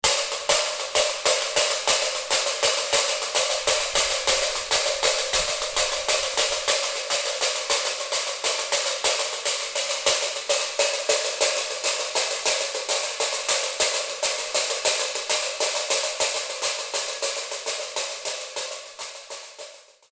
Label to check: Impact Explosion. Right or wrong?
Wrong